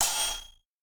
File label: breakbeat